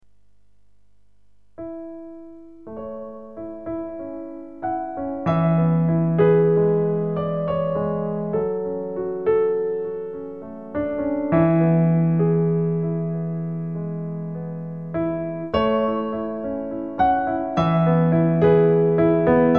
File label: piano